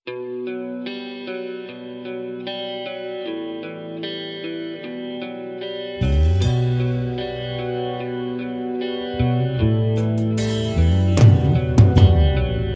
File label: guitar ringtone